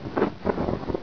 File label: flag